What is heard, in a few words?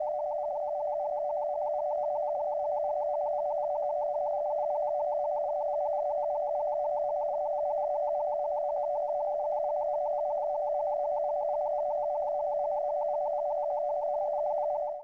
Classic Ray, Electronics; Sony Pictures Sound Effects Series Volumes 1-10; Sony Creative Software